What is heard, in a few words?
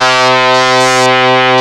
ODY, ARP Odyssey